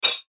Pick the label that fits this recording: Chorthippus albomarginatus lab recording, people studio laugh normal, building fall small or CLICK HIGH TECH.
CLICK HIGH TECH